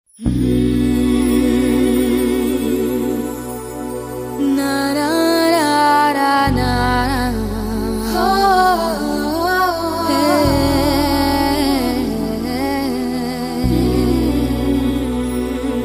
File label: Oohallonaaaaaa